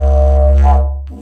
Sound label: Didgeridoo Kit Samples